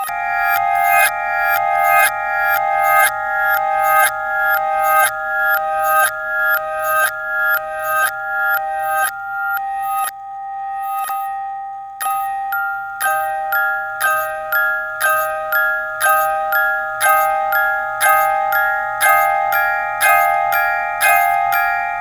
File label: music box; loopzone2